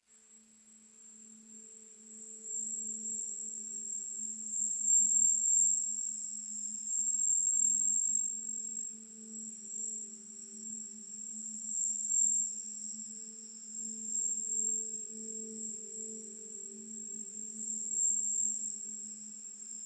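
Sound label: Splashes Fountain, Chalice Well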